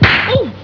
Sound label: smack